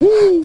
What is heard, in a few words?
"woo"